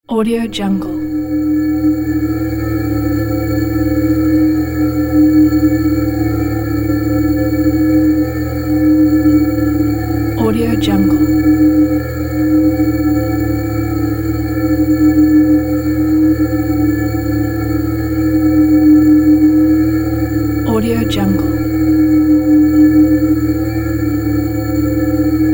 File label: drone ambience